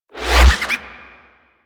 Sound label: Cast Start; Warframe; Bard